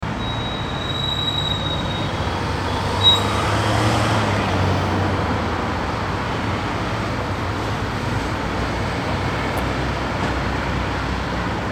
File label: surround